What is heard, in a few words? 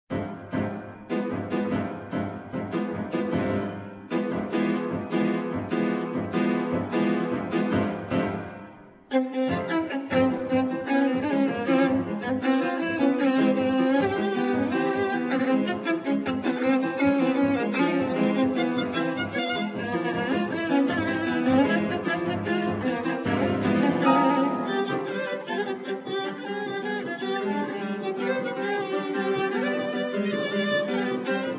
Capriccio Hassidico -Violon & Piano-; Marc-Henri Cykiert